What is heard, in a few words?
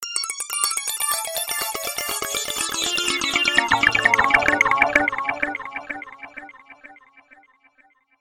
arpeggios arpeggio